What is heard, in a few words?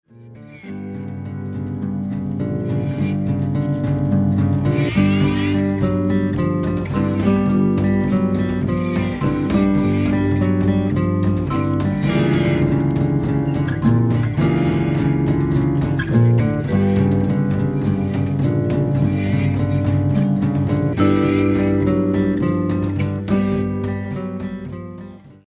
hoe slide; galveston